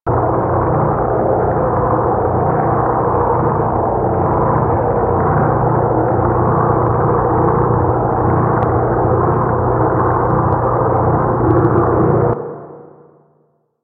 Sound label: Water Pump Flow Loop; Ambience; Props; Warframe; Grineer Forest